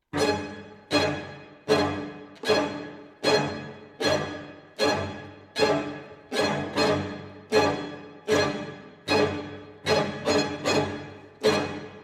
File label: String Quartet